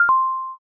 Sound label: stop button